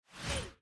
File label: fast whoosh